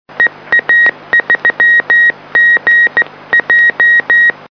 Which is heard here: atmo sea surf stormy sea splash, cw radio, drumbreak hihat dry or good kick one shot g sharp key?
cw radio